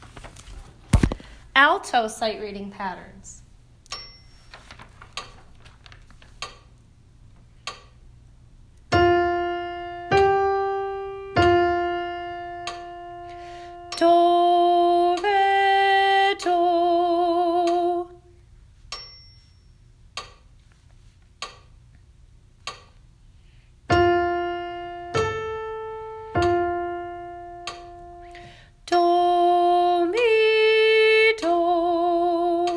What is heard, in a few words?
alto sight reading patterns